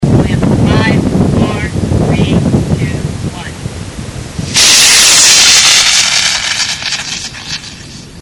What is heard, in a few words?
high power launch, model rocket; mp3 sounds from the windy June 2000 launch at Livermore